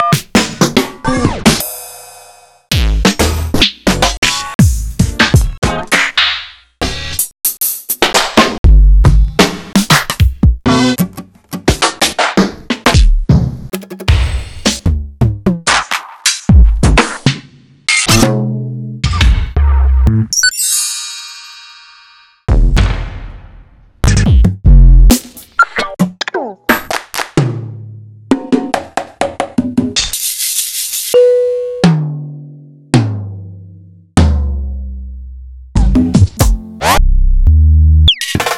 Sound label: EMU MP-70